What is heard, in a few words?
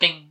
bing; boings